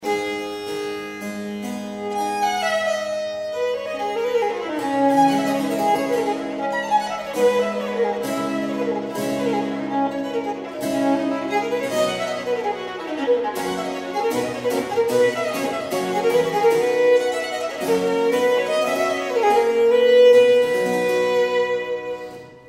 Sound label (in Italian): Sonata IV per il violino per sonar con due corde; Biagio Marini; NAWM